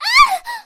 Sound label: female startled scream